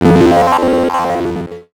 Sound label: Multimedia Internet CD-Rom Flash Hi-Tech